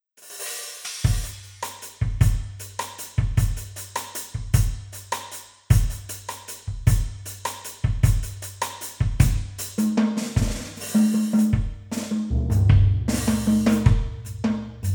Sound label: big and bright hybrid drums only; tascam